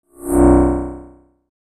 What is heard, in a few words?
HF Elect Pulse Lfe